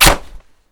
gun wood impact

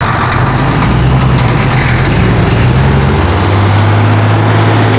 tread, Leopard

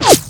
bullet air

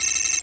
target lock; WarRock

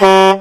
horn, Vehicle

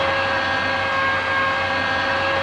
57mm Horizontal Move

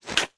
claymore set; Grenade

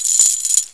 chain, Reload

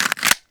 XM8 deploy

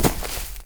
land grass, FootStep; WarRock

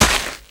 land land, FootStep; WarRock

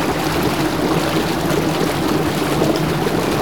IDLE, Boat; WarRock

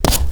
land con, FootStep; WarRock

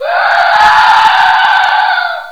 Dash; WarRock; Type E

